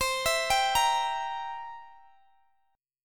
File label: C 7th C down Guitar Open D